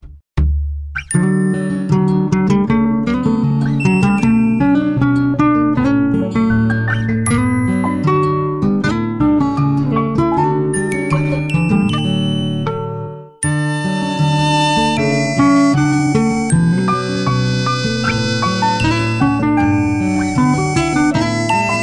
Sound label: Vivo Phone Ringtone